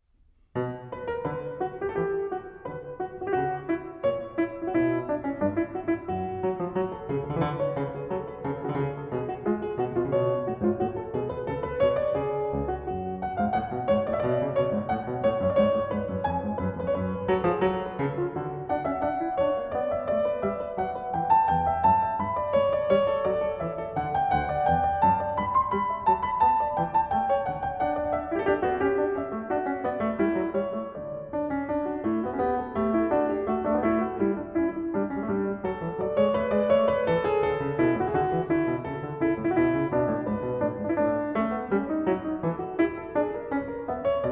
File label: Invention No. 15 in B Minor; BWV 786